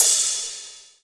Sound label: Cymbals, Normal